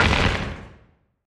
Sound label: asteroid damage interstellar medium; Factorio